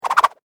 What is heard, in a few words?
Foley Whip Whoosh Multiple; Whooshes; Sound Design; SFX Bible 6